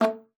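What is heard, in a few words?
A3, bass flute; Xsample VOL-2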